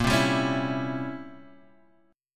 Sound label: C 7th Flat 9th Bb, chord